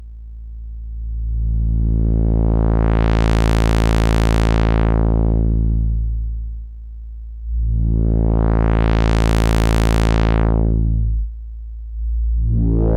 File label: ks20 lowpass, filter; diy